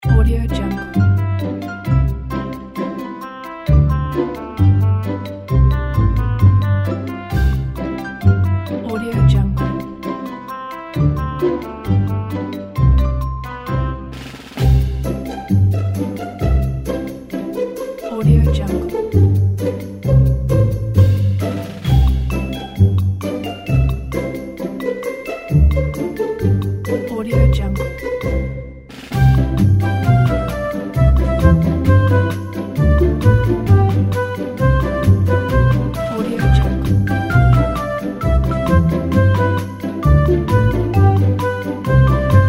funny childlike game pizzicato

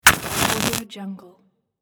match strike

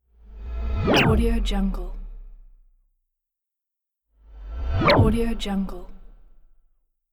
swaying action whip

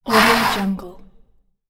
dragging sound

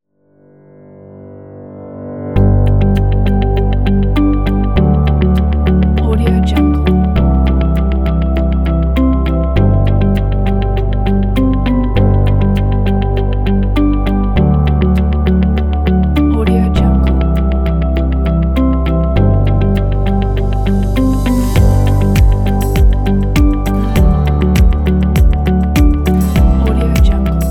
ambient corporate

